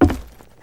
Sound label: footsteps, wood panel; player